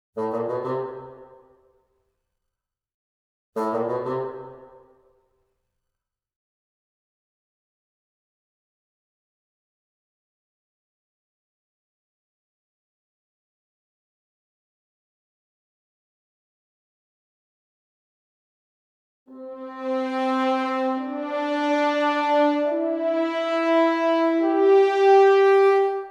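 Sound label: Music Boss Battle Layer Orchestra; MushroomBoss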